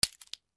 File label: Destroy Obj; kasmo